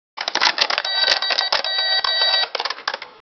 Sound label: the fast sound of the, commercial